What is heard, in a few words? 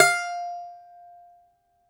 DOMRA; E-MU Producer Series Vol. 7 – Old World Instruments